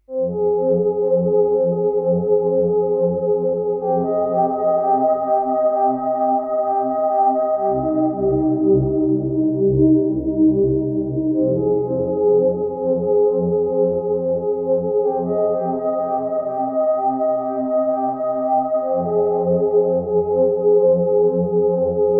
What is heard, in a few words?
pad, ambient